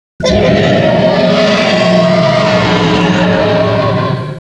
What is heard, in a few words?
roar; renesaur